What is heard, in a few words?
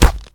flesh, bullets impact; hl2